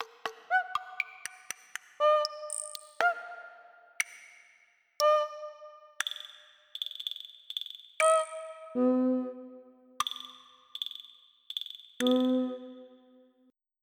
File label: Latin Hand Drums